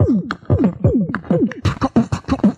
chocka, Beatbox, Drum loops

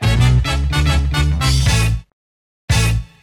horns, phonky loops

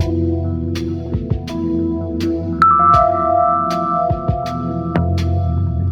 keys, Sad Loops

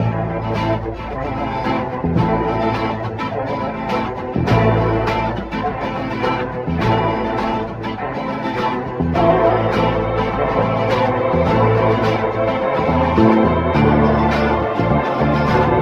strings, Sad Loops

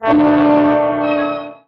horror

